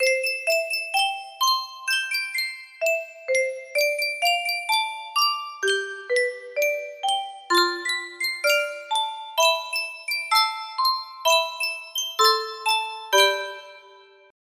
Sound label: sankyo custom tune music box